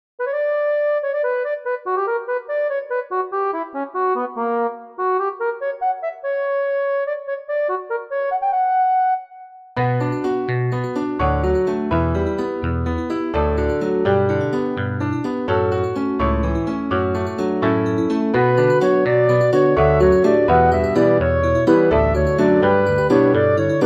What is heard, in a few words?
waltz in 9 of 8, in B minor